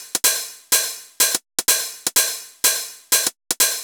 drum xox hat 125, Drum Loops, Classic Chicago House